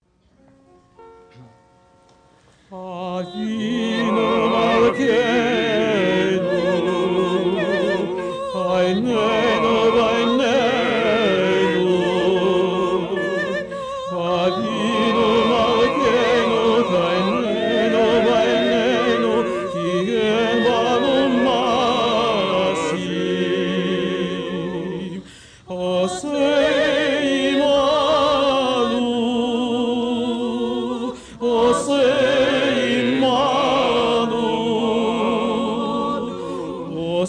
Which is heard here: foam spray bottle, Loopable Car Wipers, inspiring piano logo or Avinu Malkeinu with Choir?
Avinu Malkeinu with Choir